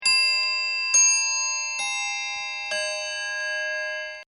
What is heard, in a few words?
Clock Mantle Three Quarter Hour Toll; Clocks